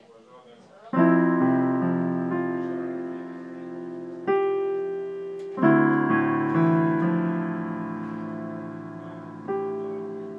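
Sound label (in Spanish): el inicio de 2 canciones que ya saque en guitarra y piano; dispuesto a sacarlas en xilofono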